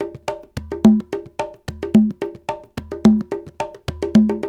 Soukous; African Contemporary; Spectrasonics; Supreme Beats

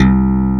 BASS CLAV, swing synths; Zero-G - Funky Elements

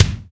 KIK, Natural Kick; Roland L-CD701; Natural K1

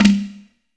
FX POT DRUM, PERCUSSION; AKAI S6000 CD-ROM - Volume 5

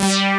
WIGGLY BS; Pro-Rec - Synthsonic; TRINITY

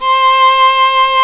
STR, Viola Solo, marc

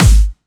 Clubby Kicks, Bassdrums

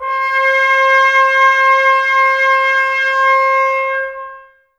brass, Tbs Mute

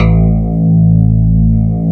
CLAV, FUNK FM CLAV

